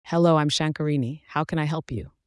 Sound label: music